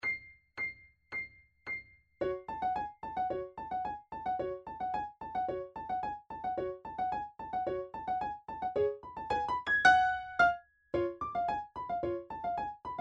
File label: Tarantella Primo T110